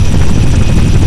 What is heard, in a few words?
rotor; falcon